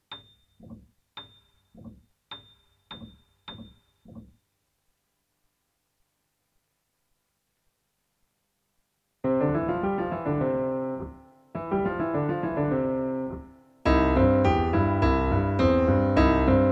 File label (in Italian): piano base intermedia